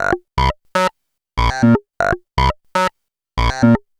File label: Synth